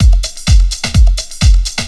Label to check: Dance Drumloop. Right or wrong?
Right